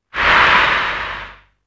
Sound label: VOICE WA; UFCTapout1; XBOX